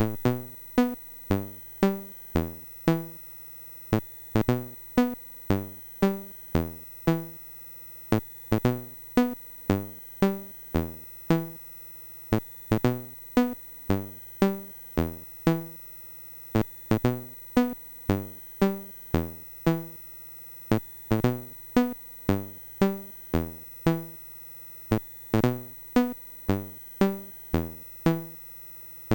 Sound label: Mini Golf, MOS6581R4